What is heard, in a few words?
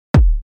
Kick; Unit Rcrds